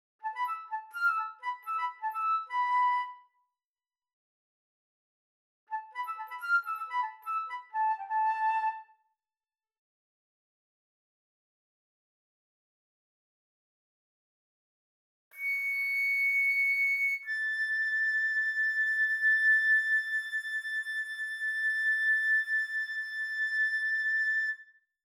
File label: Flute